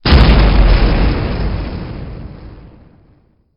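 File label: explosion; Apotheorasis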